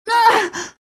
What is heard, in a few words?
hit; BattleSNDS